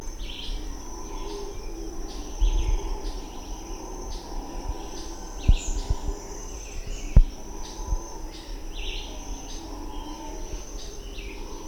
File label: Alouatta caraya; yacyreta